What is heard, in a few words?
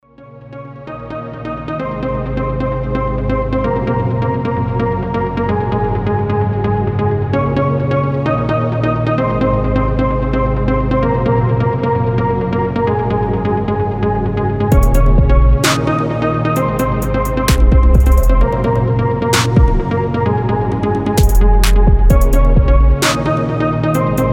Jingle